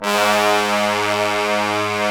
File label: BRS TBNS, Bone Sec ff; Roland L-CDX-03 Disk 2; Bone Sec.FX